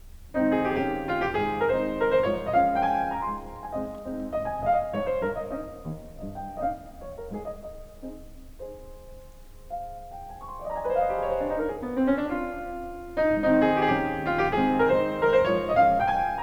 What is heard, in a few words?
Piano Trio in A Minor; Cello; Op. 50 II. (k) Variation X